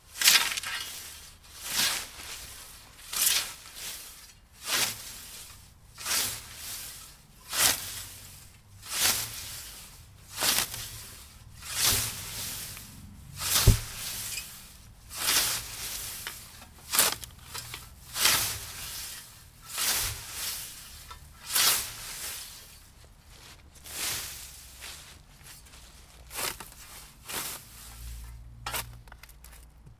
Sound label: Mowing With A Scythe